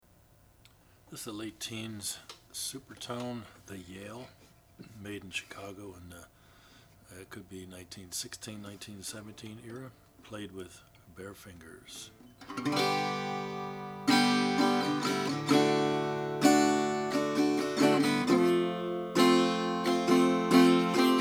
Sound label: supertone the yale natural